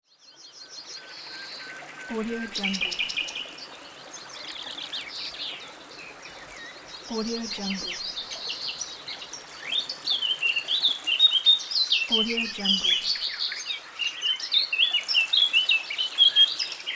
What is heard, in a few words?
nature ambience